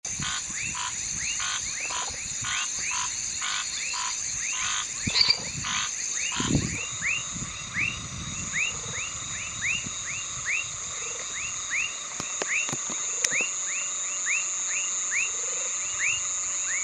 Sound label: L. macrosternum